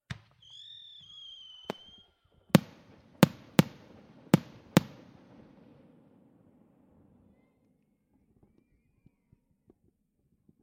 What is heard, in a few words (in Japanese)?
花火の開始の合図の音; firework